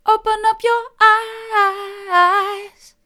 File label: mid, vocal